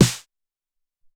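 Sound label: Dan Snare, Alesis SR-18 Drum Machines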